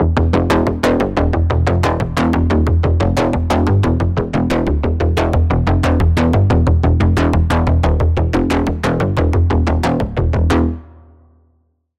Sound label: Pulse; Time Dilation; Routed Sound; Spire Expansion; Quantum Enforcer Vol.1